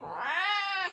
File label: cat